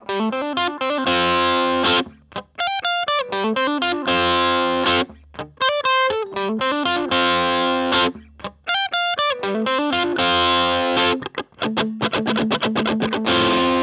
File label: Hagstrom Ultra Max Bridge